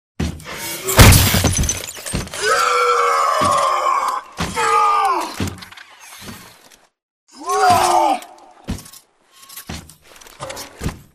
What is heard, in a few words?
Victory Kick; Coliseum; Titanfall 2